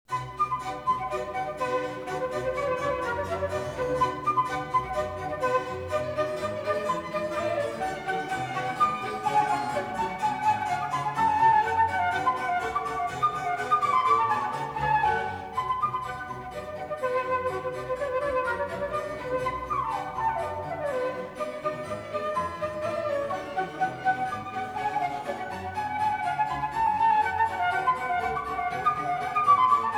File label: Suite No2 in B minor BWV 1067 Badinerie